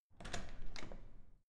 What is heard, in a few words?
security door open